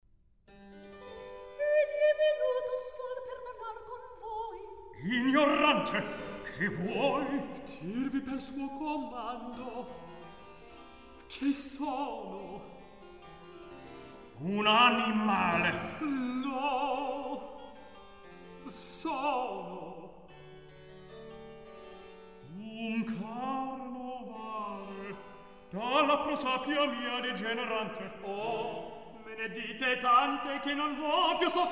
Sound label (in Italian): Recitativo; Egli e venuto; Mozart; La Finta Semplice